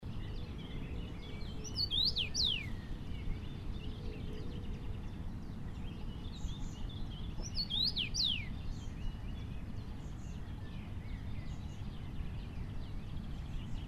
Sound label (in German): Karmingimpel; Essenbach LA; Susanne Rieck